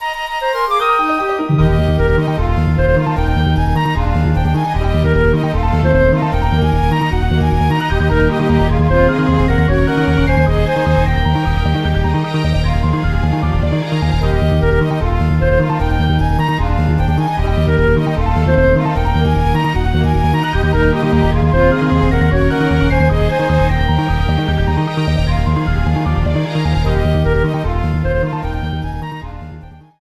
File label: Victory!; Trainer